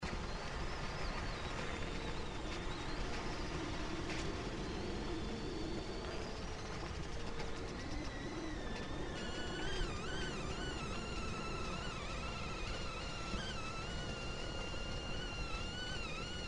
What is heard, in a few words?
ice noise, sounds arctic ocean; Sea Ice Noise; February 2010, Bering Strait; Sounds of the Arctic Ocean; RUSALCA 2010 Expedition to Bering Strait